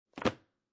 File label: Foley, Book, Hardcover, Placed On Wooden Desk; Set Down; Set Downs